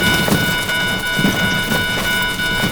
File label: overheat loop; rumble